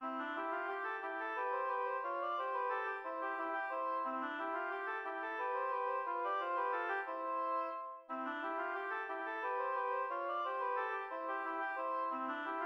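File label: oboe duet; duo no.3; heberle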